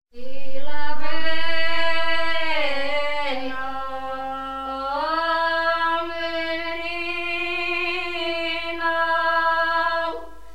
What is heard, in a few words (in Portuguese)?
Folclore